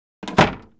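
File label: door latch close; The Elder Scrolls III - Morrowind; Morrowind GOTY GOG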